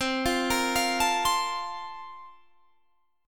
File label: Bb-Major 7th Suspended 2nd